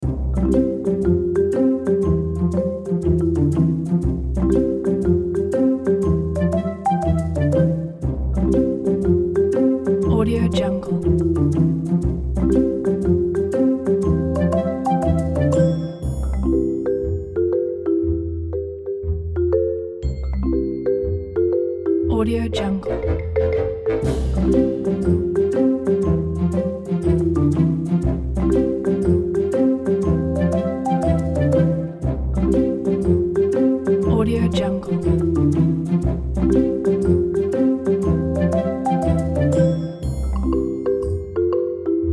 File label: curious situation